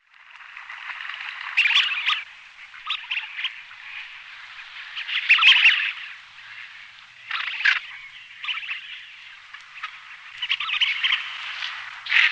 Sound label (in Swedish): Vigg, Faglar